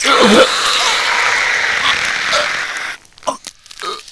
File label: die; ghouls forest